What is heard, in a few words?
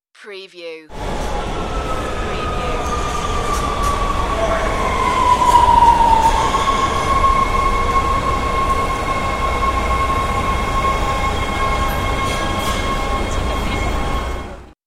Subway Arriving